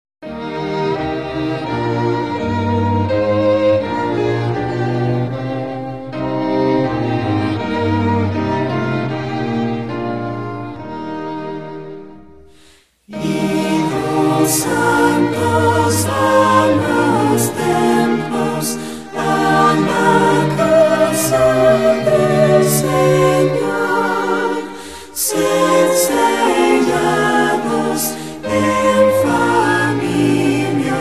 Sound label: vocal and instrumental, hymns spanish